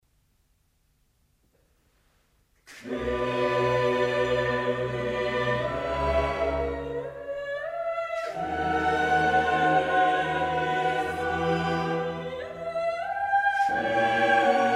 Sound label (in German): Kyrie, Bach h-Moll